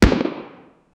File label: antique musket gunshot